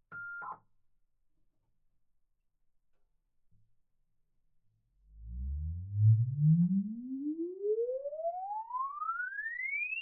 Patria 6x6 - IMPULSE RESPONSE - Passenger Compartment - INTERIOR - Middle; Pole Position; The Vehicle Impulse Response Library; Altiverb; MKH8020